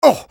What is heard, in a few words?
engineer pain sharp, hurt; hl2dm